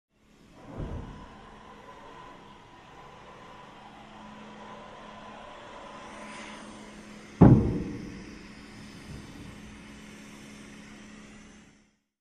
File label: Jet Cockpit Sounds in hangar